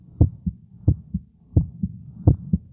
heartbeat; SpecOps2 MPlayer Demo

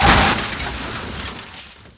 COLLIS, Prangs

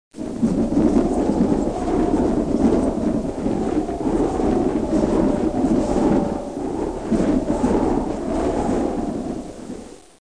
Wind Long

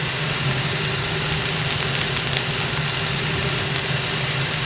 FIRE; Spearhead Demo; MacFormat 2003 June

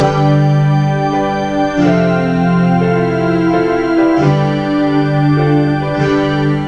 Guitar and Rest

